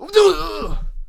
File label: Monk Death Vocals